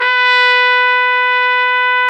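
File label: BRS RCA TP, Brass Trumpet; Roland L-CD702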